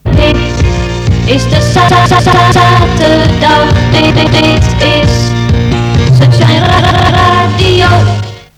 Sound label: sun Shine Jingle; SunshineJingles